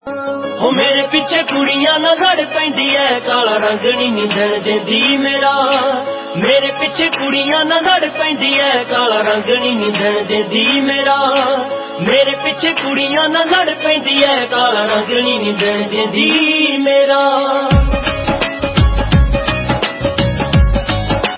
punjabi

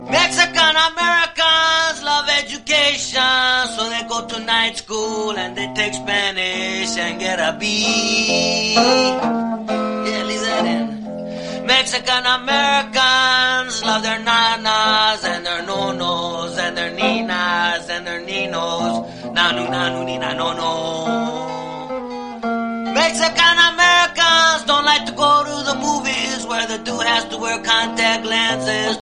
mexican american

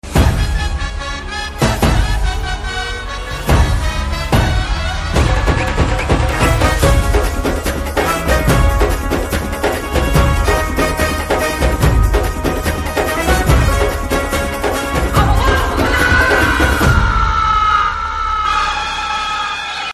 telugu best tone

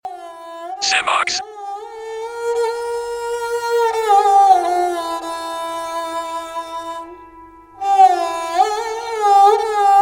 morocco house

